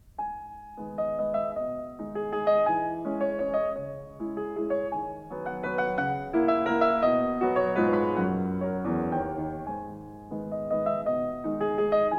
Waltzes for piano, 4 hands (or piano), in G-sharp Minor; Brahms